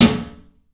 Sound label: club snare, 3D Drums; 3D Sound